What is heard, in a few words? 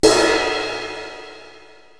Crash Cymbal; Korg TR-Rack